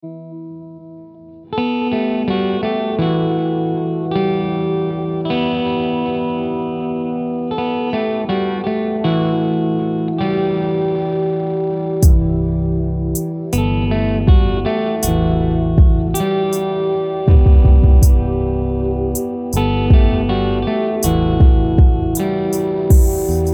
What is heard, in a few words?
Lofi